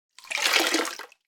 household water squeezed from sponge into kitchen sink bowl